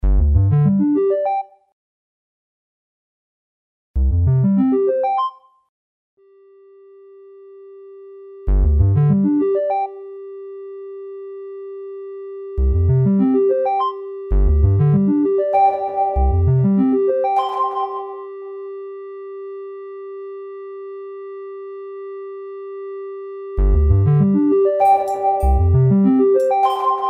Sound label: Bell Def Tone Mix; hatfortad